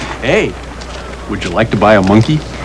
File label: monkey